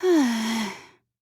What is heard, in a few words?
sigh; toko